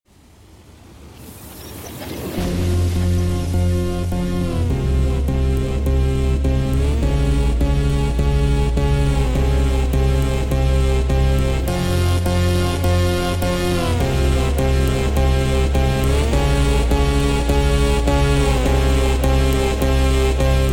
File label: Funk super Slowed